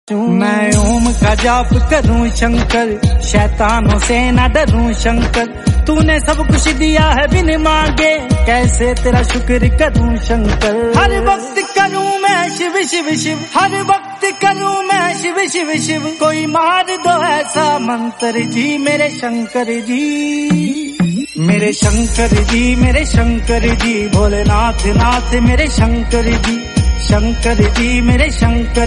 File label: devotional